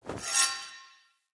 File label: Weapon Fragment Pickup